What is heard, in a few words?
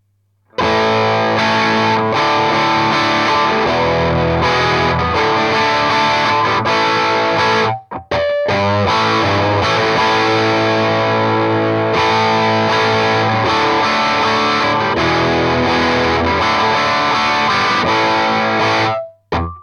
crunch